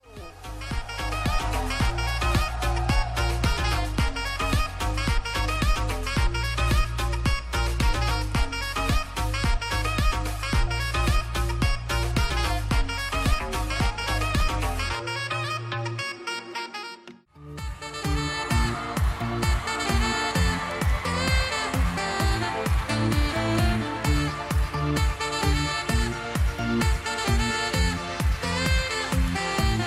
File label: sax